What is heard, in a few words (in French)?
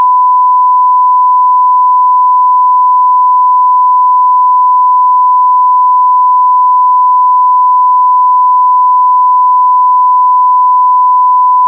1000 Hz niveau max